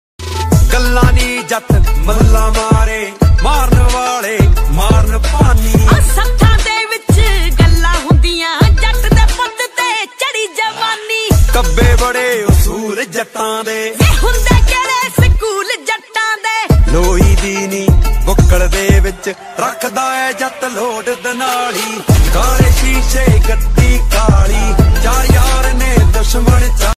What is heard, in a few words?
Punjabi Ringtone